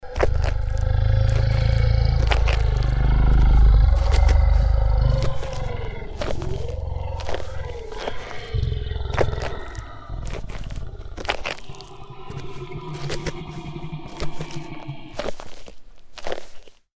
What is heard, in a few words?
large dinosaur eating; a dump in the dark